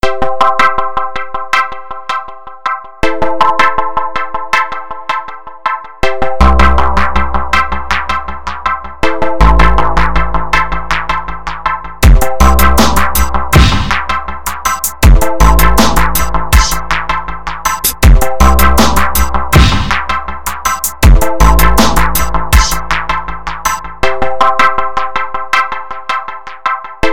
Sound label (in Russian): krasivaya melodiya na budilnik; vstavay ringtone